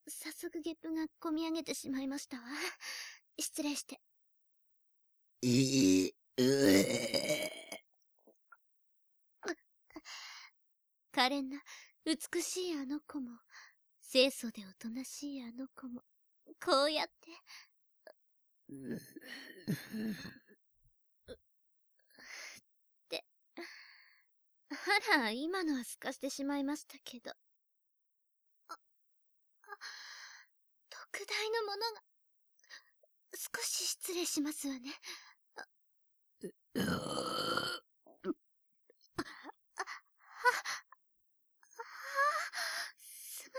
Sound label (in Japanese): 本編ゲップシーン集; 本編のゲップシーンを網羅しております